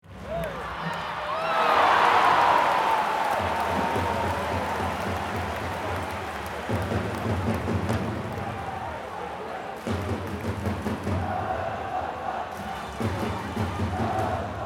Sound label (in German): Handball Hallen Spiel Atmosphaere